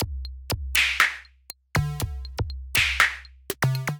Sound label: Pitchy Kit, Synthesized; Drums; Ableton; Factory Packs; Drum Essentials